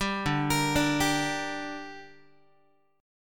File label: D Suspended 4th Sharp 5th down Guitar 4A,4E,5A,5D,5G sharp,6C sharp; arpeggiated